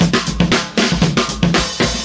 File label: blow your top, drum loops and breaks, 118 bpm; 111 to 120 bpm